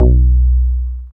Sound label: BAS TX-8020D